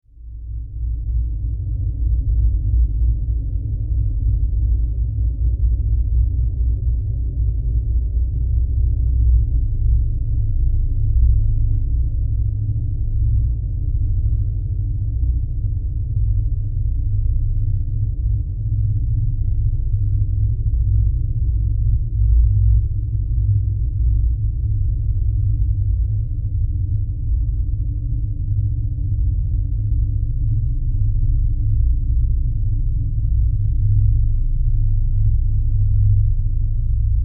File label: Horror Drone Deep Rumble; Evil Sound Effects; Evil (Sound Effects:Horror)